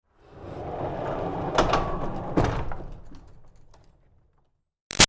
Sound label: Door Barn Rolling Wood Bang Light; Doors; Big Room Sound